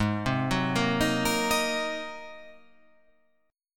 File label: G sharp 9th G sharp